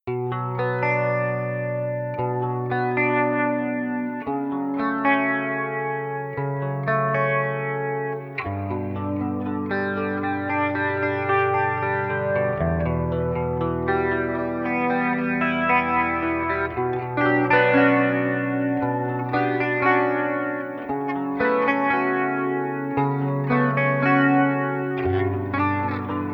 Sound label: guitar remake